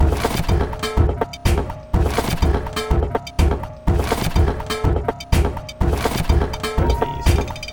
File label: techno